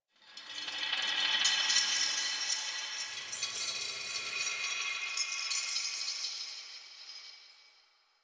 Temple Pillar Chains; Warframe; Ambience; Orokin Derelict; Red Veil Temple

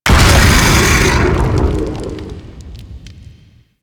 Burrow Exit; Ambience; Golden Maw; Warframe; The War Within

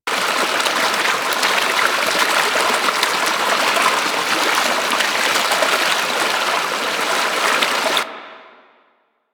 Waterfall Loop; ambience; props; loops; Warframe; Venus Open World; Venus Landscape

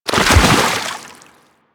Roll Forward Foley Water B; Enemies; Warframe; Teshin; DE

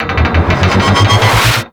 Stomp Woosh; Teralyst; Abilities

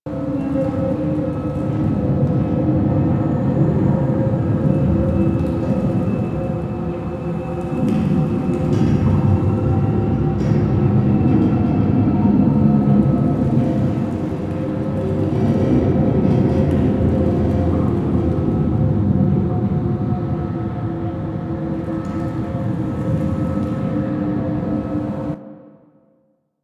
Galleon Generic Large Amb; Warframe; Ambience; Grineer Galleon

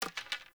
Hunter Bow Ranged Equip; Weapons; Player; Bows; Envoy Hunter Bow Ranged Melee

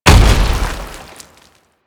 Tank Infested Step Default, Movement; Warframe; Lasrian Tank

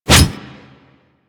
Ghoul Devourer Melee Swipe; Warframe; Grineer Ghouls

